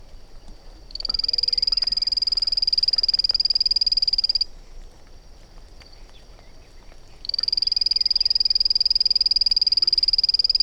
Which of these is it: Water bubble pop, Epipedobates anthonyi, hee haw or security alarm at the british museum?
Epipedobates anthonyi